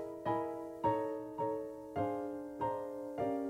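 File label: Piano Loop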